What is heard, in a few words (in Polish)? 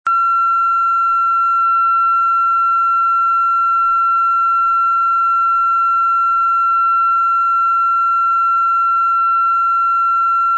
syrena